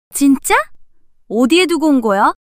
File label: surprise